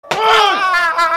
booowwaaa

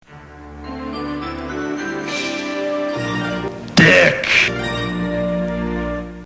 fanfare

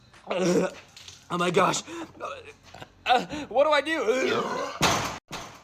what do i do burp

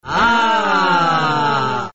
aaaahhh

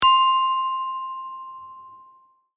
bell

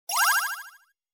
bongo

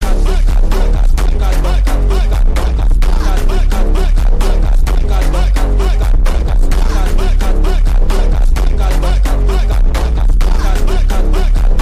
phonk bassboost

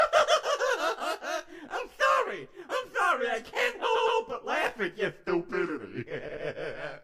laughing; lolbit; can hold laughing against stupidity

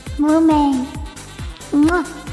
mwah; fauna; mumei

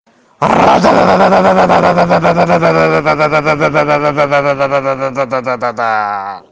fireworks; murilo basso

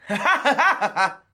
hahahahah

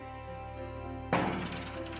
cat runs into glass door; on french bakery programme; cat fail

